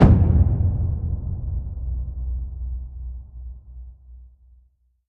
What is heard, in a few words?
Taiko Hit